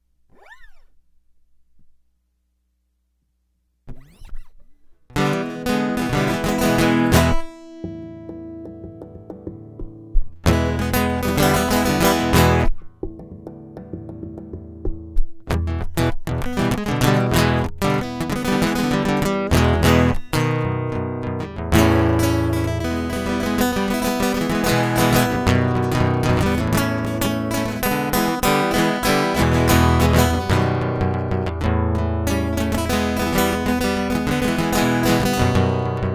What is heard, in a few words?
Guitar Loop; Wake of the Downpour